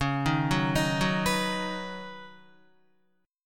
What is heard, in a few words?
Db 7th Suspended 2nd Suspended 4th Db Guitar; 8,6,6,8,6,7; Guitar-4F,4A,5C,5G,5C,6E; Db7sus2sus4 arpeggiated